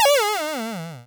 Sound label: life lost; Ulmos Adventure; pygame